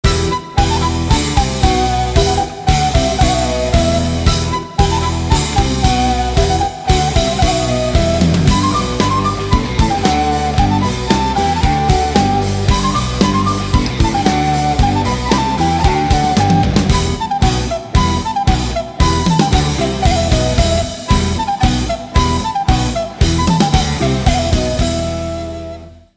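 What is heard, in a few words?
flute and electric guitar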